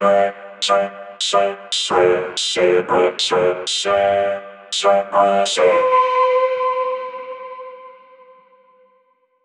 robotic hardstyle vocal, electronic music

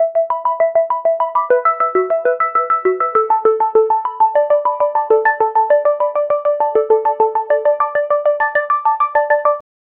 melancholic arpe, arpeggio

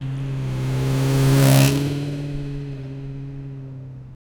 motorbike unbalanced drif

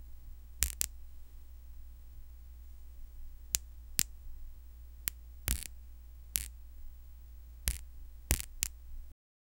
materials

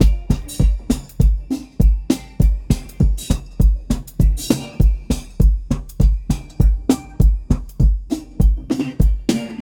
reggae

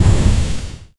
big smooth explosion of s, shimmering particles

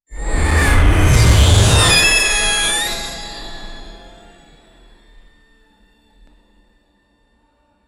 short and fast game sound, metallic hit

